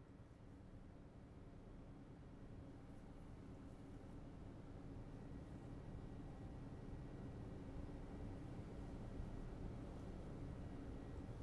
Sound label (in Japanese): 胎動耳かき 耳たたみマッサージ、お腹の音を後頭部から聞きながらの左の耳かき; 夏葉の胎動耳かき